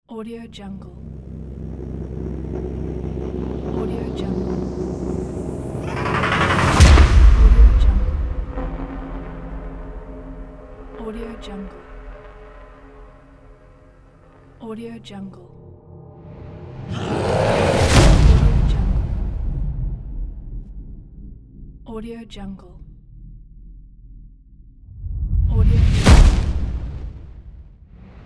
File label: cinematic trailer sound pack